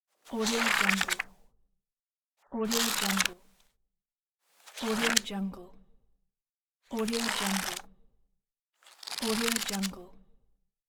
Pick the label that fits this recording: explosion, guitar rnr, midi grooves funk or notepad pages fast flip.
notepad pages fast flip